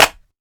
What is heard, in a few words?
Clap